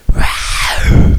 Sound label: ooaawoo